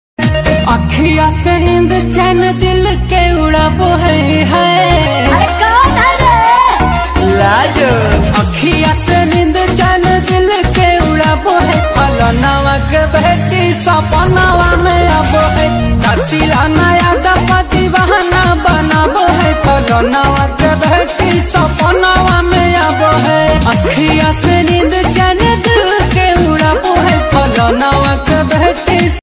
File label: bhojpuri ringtone